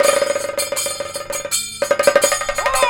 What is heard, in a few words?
KATAK, Indian Traditions